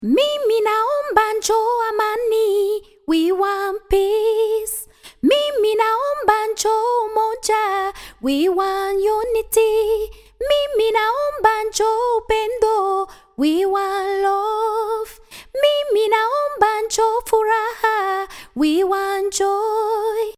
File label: Vocals Swahili English